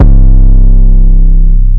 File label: 808 C; The Lunchbox - Lunch77 (Shows the Screen) Drumkit; 808s